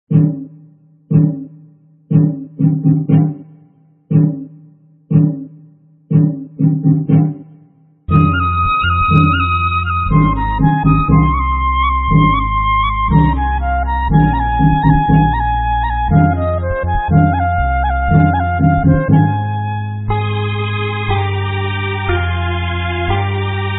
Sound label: Tamil Karaoke